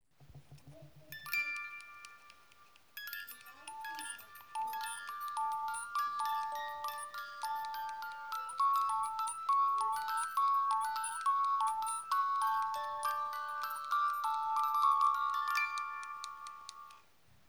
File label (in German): Spieluhrlied